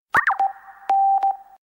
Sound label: super notification